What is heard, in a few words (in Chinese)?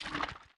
幽匿蔓延声